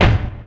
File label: Lowpass Hit, Percussion Hits; Zero-G; Soundclash; Acidized Wavs